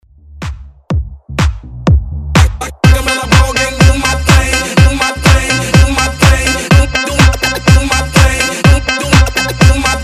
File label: Top40 Hype